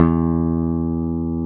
Basses, Roland

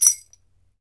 BEL RATTL, African Perc

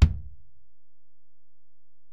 Ac BD, Alesis DM-PRO; 300 Drum Machines

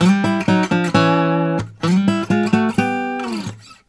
Blues Guitar; ILIO; Fretworks; LICK

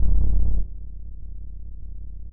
Sub, drum; Subs; Drum Bits; layering

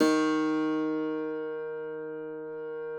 Thumb Tack Piano ff, D1; ThumbTack Piano ff -ped; Best Service ProSamples vol.53 - Classical Prepared Pianos; Total Piano

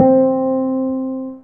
Pizz Open C3, Fairlight CMI IIe Pizz Str

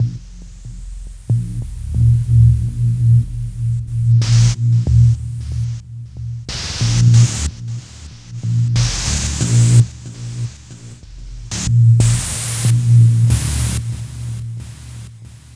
Spectral Throb; Sonic Foundry (Sony Creative Software); Loop Noir

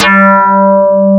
QUITAR

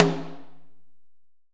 HALL SN, HALL SNARE

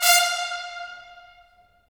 BRS, Tps Stacc; Roland - LA Composer vol. 4

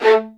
A, LOUD VIOLINS; Q Up Arts - Denny Jaeger Master Violin Library

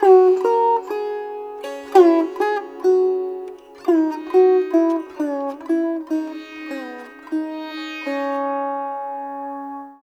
SITAR; Spectrasonics; Heart of Asia

